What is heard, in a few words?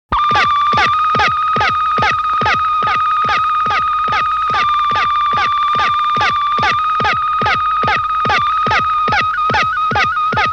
Spock's Viewer On The Bridge, Star Trek Original T. V. Series Sound Effects